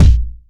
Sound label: steel kick drum a sharp key; kick drum samples